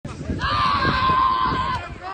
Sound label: screem